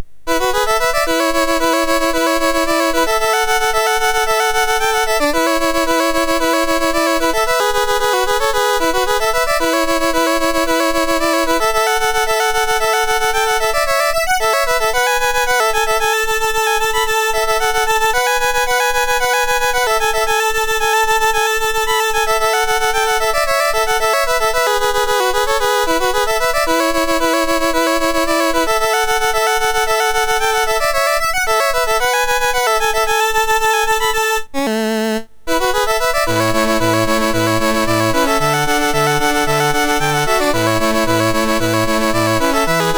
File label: Cajun Fiddle, MOS6581R3